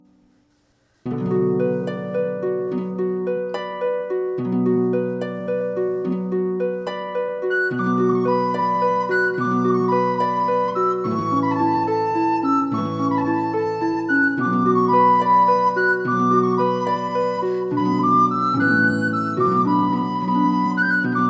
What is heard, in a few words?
march for flute harp; brian boru; traditional